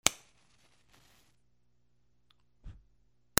FIRE

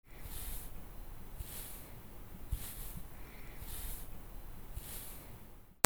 BATHROOM

